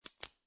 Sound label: Pen Click Close, Office, Pen, Click Closed; Pens; Offices